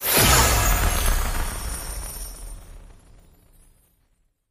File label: win Impact; mercy of the gods